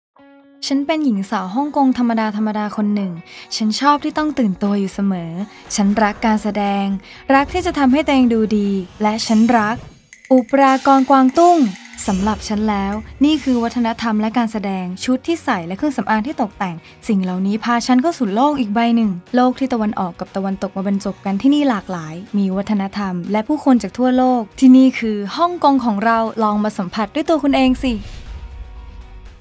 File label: Thai Opera; BrandHK